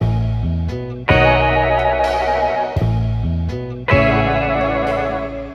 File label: horns bass, Loops, Phonky